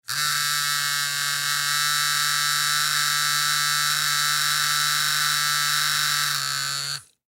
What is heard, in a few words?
Pager Vibrate Long; Technology; Noise; Electronics; Blastwave